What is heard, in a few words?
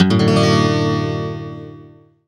STL STRNG CRD; Best Service; World Colours